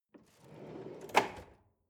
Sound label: drawer large draw open lock